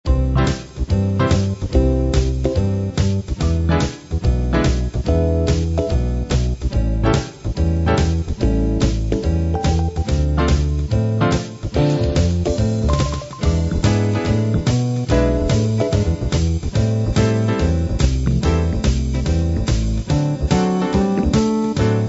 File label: cool jazz